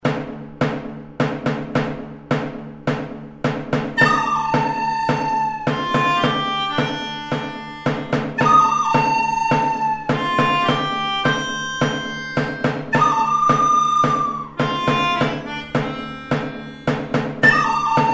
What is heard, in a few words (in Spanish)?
viejo oeste cancion